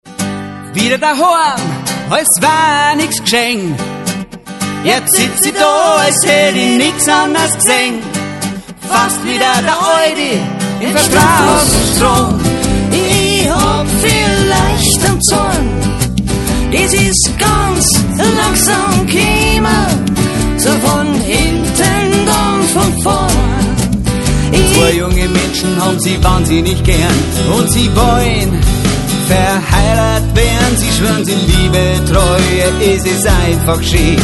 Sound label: Kitchen House Band